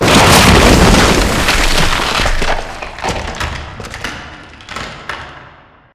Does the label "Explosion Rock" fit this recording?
Yes